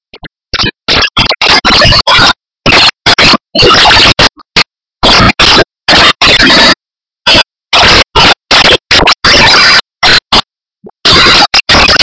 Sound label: Morning rainforest Ketambe